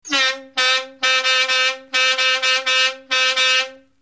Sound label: vuvuzela